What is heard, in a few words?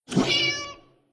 cat